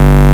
Bass Shot G

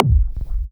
Kick

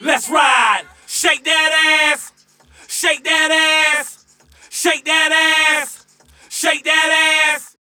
VOX; Loopmasters; Keith Mackenzie & Fixx Present Booty Breaks Vol.2

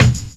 Southern Kicker; Drum Hits; Kicks; Loopmasters; Jon Carter & Alex Blanco; Block Party Essentials

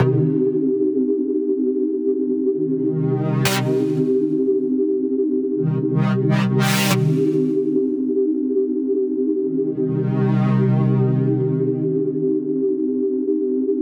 Bpm139 Chord Loop Cm, Dubstep; Airy; Fringe; Prime Loops; Dubstep Illusions

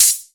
Open Hihat; Mutekki Media; Vengeance Electroshock Vol.2; VES2 Cymbals

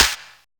Clap, Dubstep One-Shot Drums

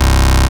Bass Shot C

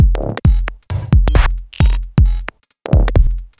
Bpm133 Break, Dubstep, Drum Loops

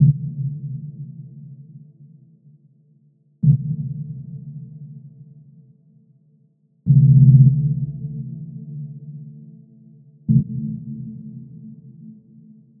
Bpm140 Pad Loop Am; Airy; Beacon; Prime Loops; Dubstep Illusions

